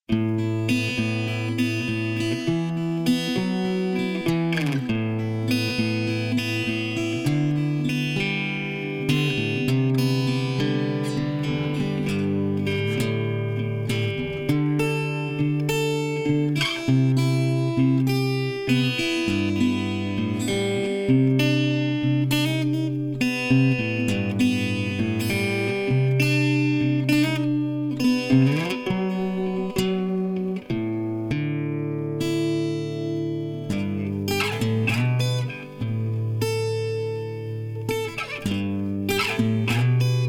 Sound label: trinity no drums